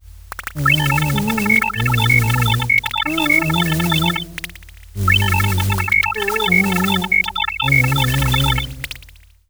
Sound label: real goblin audio